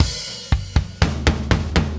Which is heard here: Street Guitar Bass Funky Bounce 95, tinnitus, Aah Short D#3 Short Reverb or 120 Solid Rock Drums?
120 Solid Rock Drums